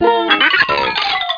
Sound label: close; Little Red Riding Hood